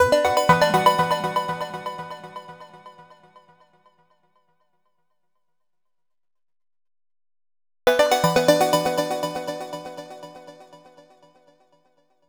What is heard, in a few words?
122 Bm Synths; Complex Electro Synths; Prime Loops; Amplify